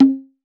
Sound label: Hager, Percussion; Roland BK-5